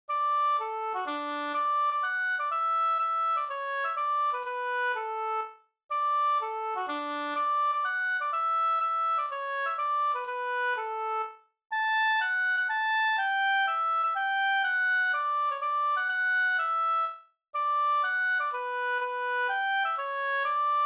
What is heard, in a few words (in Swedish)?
folkdans, Sanglek; Hopp Pelle da fr Sd Rosenberg 1-62